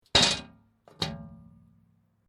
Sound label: Sewer Cover Up Down, A metal plate or metal cover clank.; Metal Sound Effects